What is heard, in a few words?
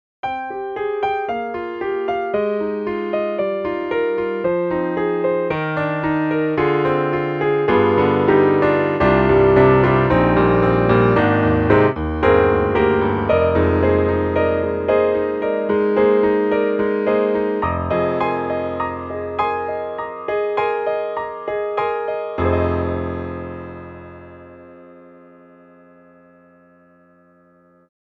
General Piano; VSTi; Safwan Matni